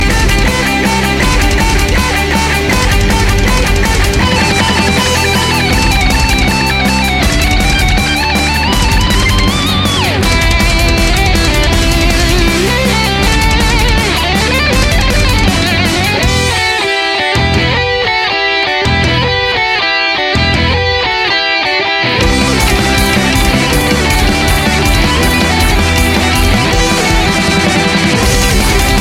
metal music

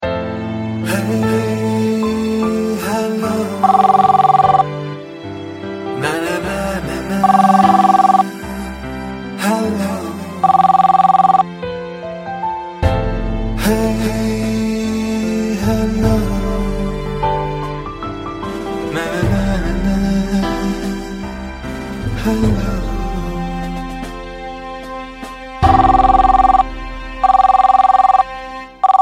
beautiful ring

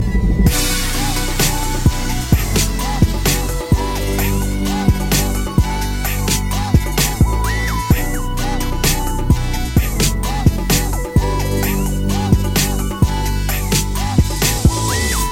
hot beat

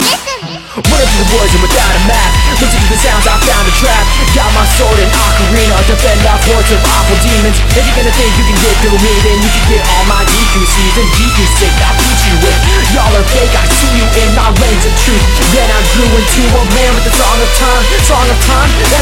dubstep rap remix; Legend Of Zelda: Ocarina Of Time

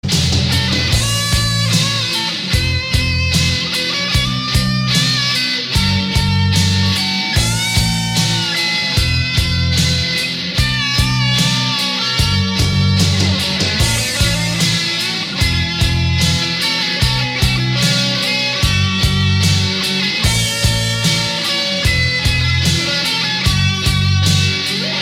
solo

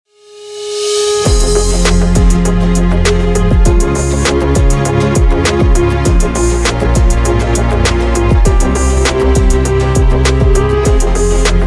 background music

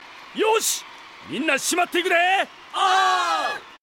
japanese cheer

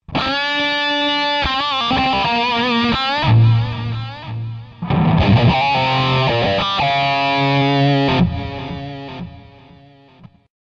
guitar intro

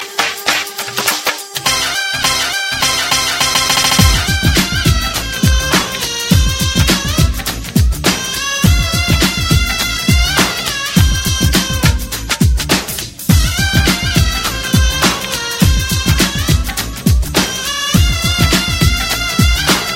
remix arabic

